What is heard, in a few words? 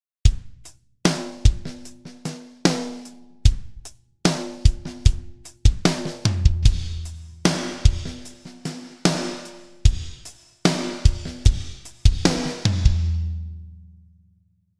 Drums Medium Plate